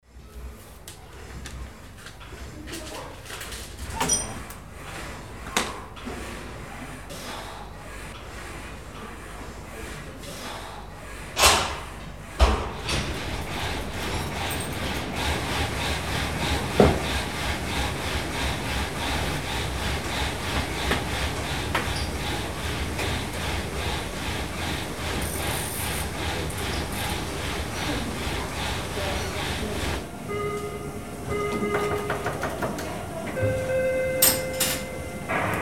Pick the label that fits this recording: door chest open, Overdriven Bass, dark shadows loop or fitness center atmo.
fitness center atmo